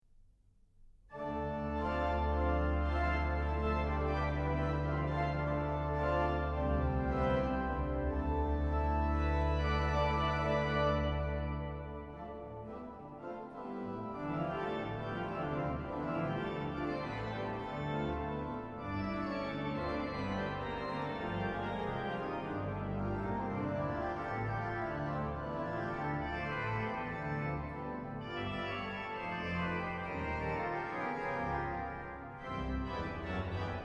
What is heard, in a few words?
Vivo